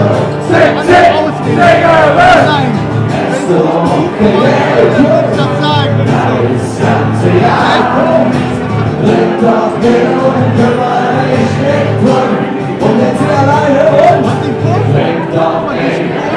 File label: local party music